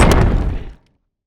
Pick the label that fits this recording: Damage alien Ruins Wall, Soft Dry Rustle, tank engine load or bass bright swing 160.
Damage alien Ruins Wall